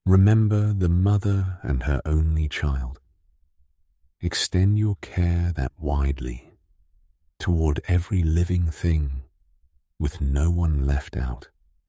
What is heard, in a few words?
drop, remix